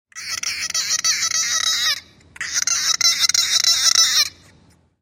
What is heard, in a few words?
dolphin; muon noi voi chung toi dieu gi do